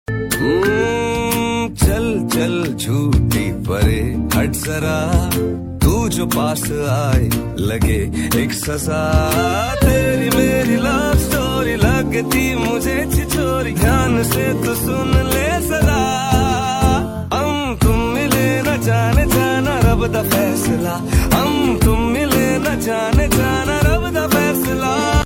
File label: Ost pakistani ringtone